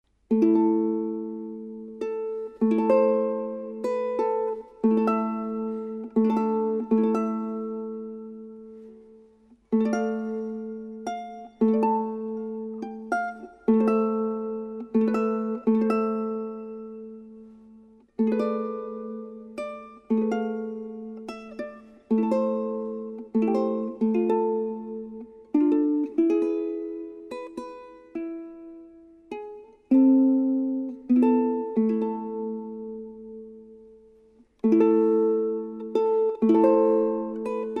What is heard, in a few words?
Ukulele